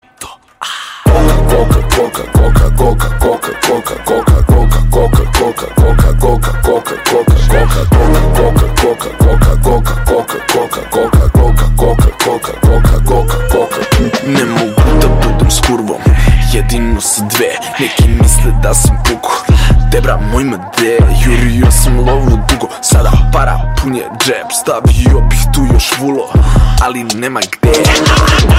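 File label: SRB RAP, Serbian rap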